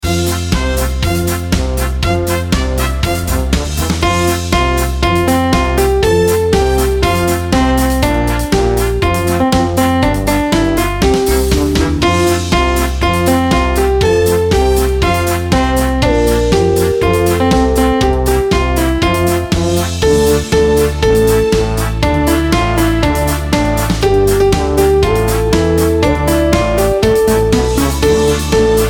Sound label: karaoke